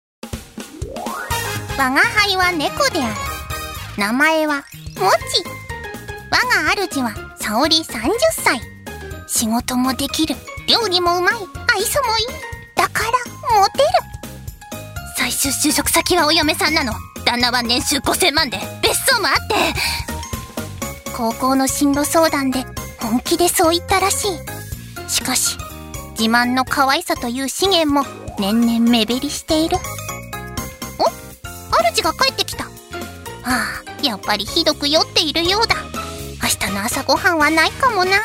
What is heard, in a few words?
drama cat